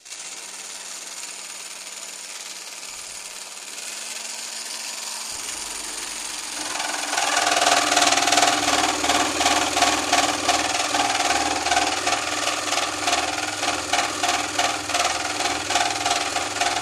Saw Jigsaw Cutting W

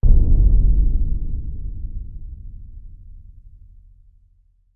Bass Impact Low Drum; impacts

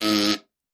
Bowl Fart Resonant; farts

